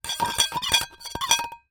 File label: foley tin small scrape metal knife; zapsplat